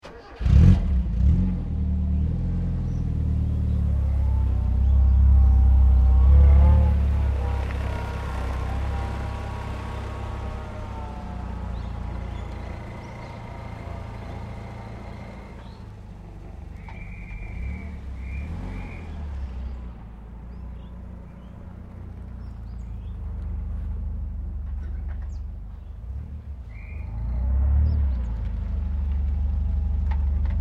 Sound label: CAR TOYOTA COROLLA STARTUP REVERSE PARK; CAR TOYOTA COROLLA 1980 STARTUP REVERSE PARK; Automobile, Motorcar, Transport, Vehicles